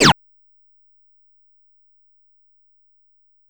Turret Shot

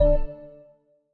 Ui Reward; Forza Motorsport 6 Apex

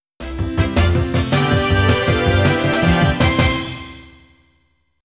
Success; Hey You, Pikachu!; N64

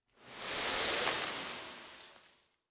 Burying

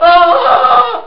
Scream; NPC Scream

